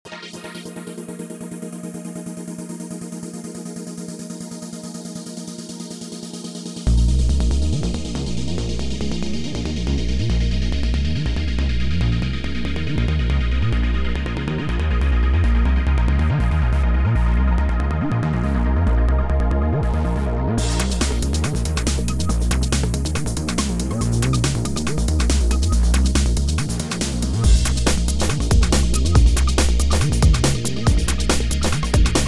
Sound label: Techno